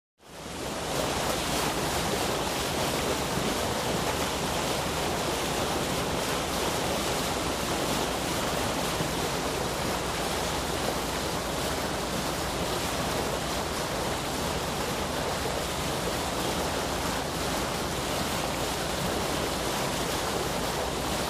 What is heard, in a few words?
Close Bow Wash Lg Boat, bow wash